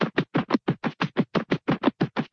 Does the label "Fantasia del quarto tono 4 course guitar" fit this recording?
No